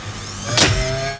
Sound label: ELECTRONC, HYDRAULICS; Northstar; The Wizard VOL-1